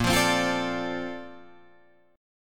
A sharp Major 9th, Guitar Drop D

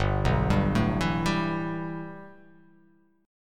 G 6th Add 9th G, chord

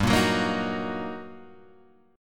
G Minor Major 7th Sharp 5th, guitar chord; GmM7#5 Chord {3 1 4 3 4 2} strummed

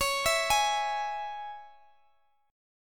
C sharp Minor, Guitar Open G

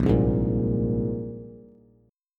Bb 7th Suspended 2nd down, Bass; Bb7sus2; strummed; JGuitar

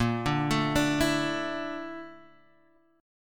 A sharp Major Sharp 11th, Guitar, Drop D

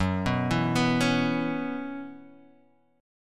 Gb 7th Suspended 4th Gb down Guitar Open F, chord; Gb7sus4; arpeggiated; JGuitar

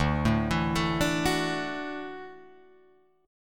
D-Major Add 9th D down Guitar Drop D

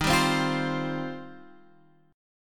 Eb 6th Add 9th Eb, Guitar Half Step Down

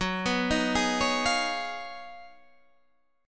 G sharp Minor 13th F sharp, guitar chord

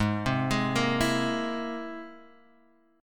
C Augmented 7th Ab

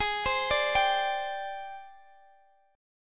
Ab Major 7th Flat 5th Ab Guitar Open G; x,x,18,17,15,17

